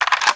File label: shotgun out; nzp